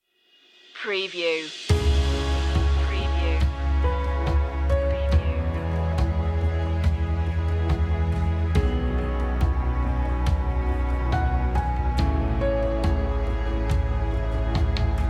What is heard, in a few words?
epic strings less synth